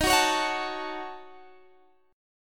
D sharp Minor 7th Flat 5th D sharp down Guitar Standard; strummed